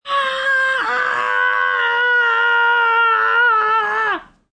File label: Scream Breathy, Scream, 1 boy, yell, low-pitched, raspy, human voice, kid, child